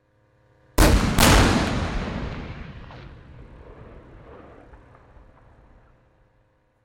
Chieftain Tank, firing armour piercing round (Close perspective); Guns Tanks; BBC